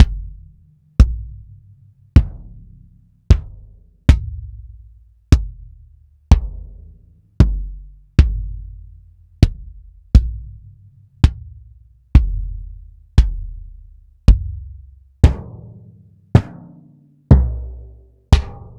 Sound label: Roland TD-20 Bassdrums; Sliced